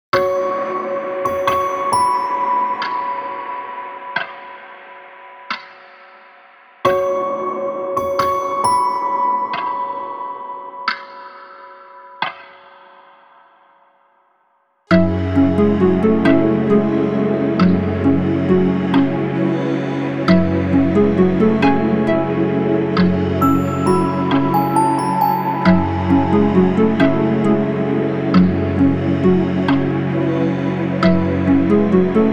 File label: Instrumental